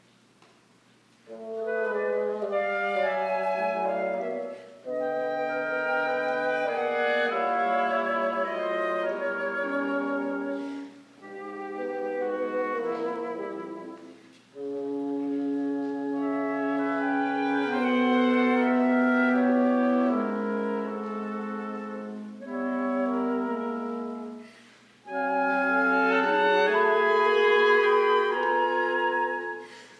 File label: Presto, Wind Quintet